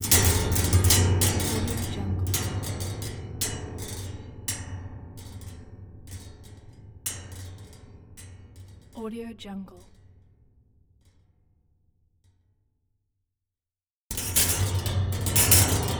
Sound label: huge spring chaotic movement